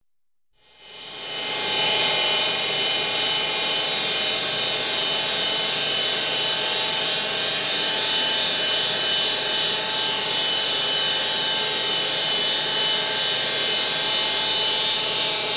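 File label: sound of an air conditioner compressor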